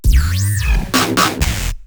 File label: Synth Fills G- 128 BPM